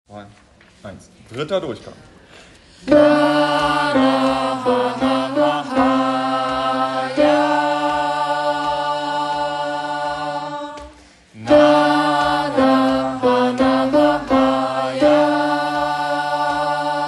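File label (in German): Alt 1 Takt, gospel choir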